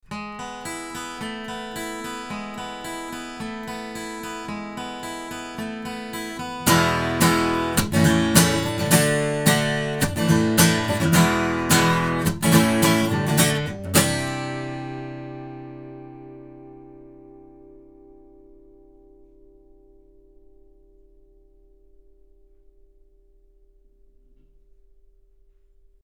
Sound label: BV-1 omni guitar; Avant; Avantone